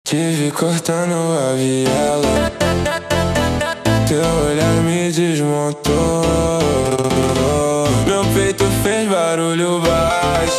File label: slowed Reverb, funk